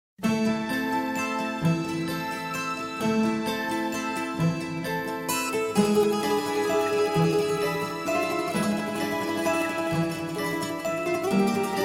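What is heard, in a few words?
instrumental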